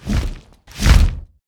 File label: flap; ice age scrats nutty adventure